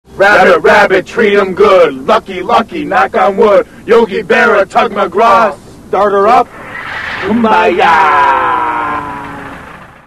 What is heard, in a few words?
lucky song